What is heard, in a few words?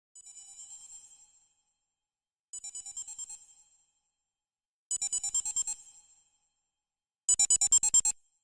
digivice beep